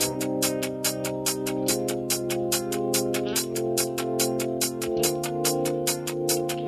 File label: keys, jazz loops